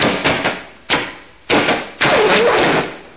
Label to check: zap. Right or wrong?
Right